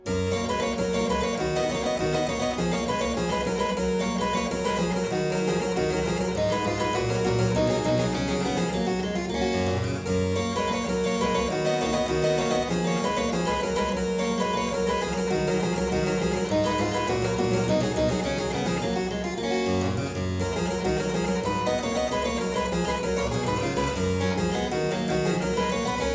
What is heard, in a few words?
Aria in G major Partita 23, Harpsichord